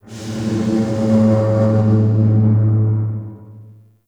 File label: Distant Pipe Burst; Ambience; Warframe; Corpus Railjack